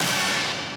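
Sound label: b stab1b, note F octave 5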